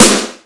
Electronic Snare; Yamaha PSR E305

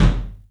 ROOM KICK; 3D Drums

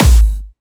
Clubby Kicks, Bassdrums

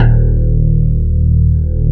CLAV, FUNK FM CLAV

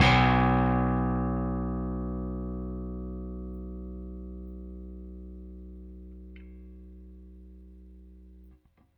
Rickenbacker Bass; Airwindows

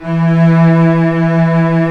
Vcs2 f vb; STR Vcs 1-2 vb

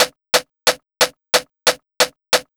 DRMS, Underground Hip Hop

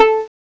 PIZZ HI, HISTRING; CMI Sound Library Rev 1.3

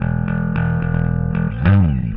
Bass, Indie Rock

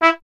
Trumpet; Miroslav Vitous - Solo Instruments VOL-1

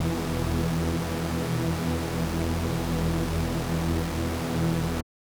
SuperSaw E3; SuperSaw1; KG MegaSaur; M8

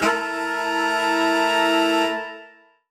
Horn Swell Alt Amin9; undercover samples

